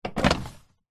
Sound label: household washing machine drawer tray slide pull out